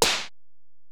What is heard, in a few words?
EPerc, Alesis DM-PRO